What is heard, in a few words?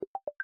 voice notification on